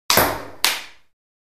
Ping Pong Served

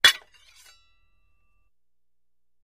Axe Clanks Scrapes Ax

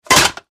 Bullet Impacts Metal; barrels buckets & cans

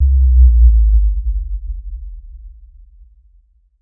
Bass Impact Low Tona; impacts

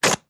Balloon Deflating; balloons

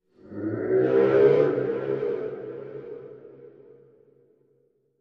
Animal Stinger Roar

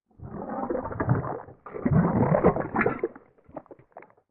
Movement Large Wet; mvmt

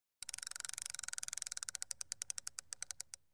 Fishing Reeling Uns

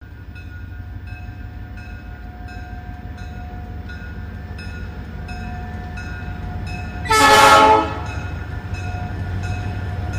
Train By Slw Horns, steam train

